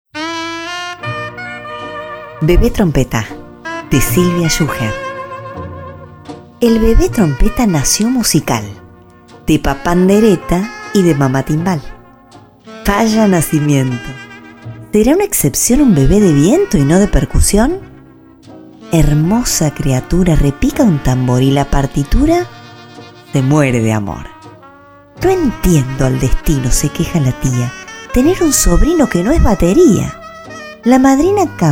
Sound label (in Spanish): Bebe Trompeta